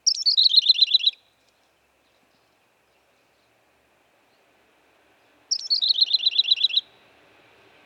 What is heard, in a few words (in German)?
wuestenprinie